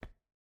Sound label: Footsteps Rising Bridge; Main Character